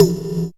percussion, Dance Synths; Mad Kit

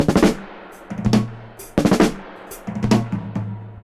76 FILL FX, Dub, DRUM LOOPS, 076 BPM; Drumdrops In Dub VOL-1

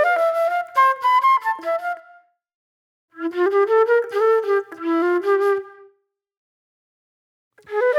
Reflective Flute

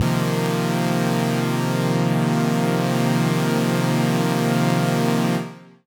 Synth C, Synth Prophet One Shots; Electronisounds

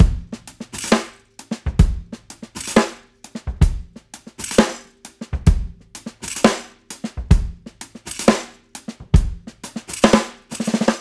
triplet-ey phrase; CLEAN KIT; Tuna Beats

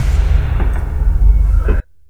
Noise, Dark Organic Processing; Sonic Foundry (Sony Creative Software); Loop Noir

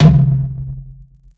MEDIUM TOM UNDERWATER, ELECTRO KIT

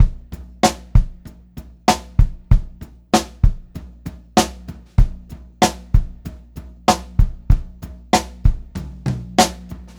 Pop-Rock Drumloops; 96 POP 2

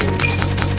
Noise Loop

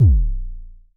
Mild Thump Kicks A#0, Vermona Kick Lancet; LookIMadeAThing Kicks; Mild Thump Kick - Precise

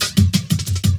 LOOP SD, Drum & Bass, 180 BPM; Ueberschall; Drum & Bass Resonance

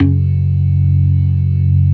ORGAN SYNTH; InVision Interactive; Keith Emerson

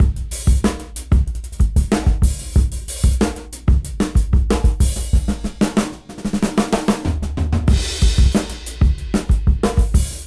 Busy Break 93 BPM

